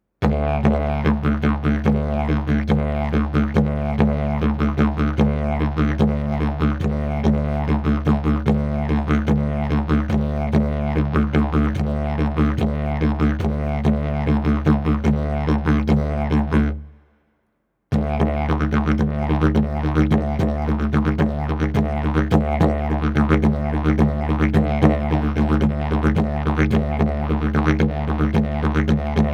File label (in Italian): Masmudi kebir